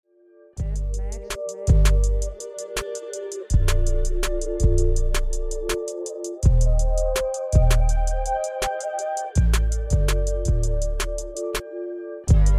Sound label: Instrumental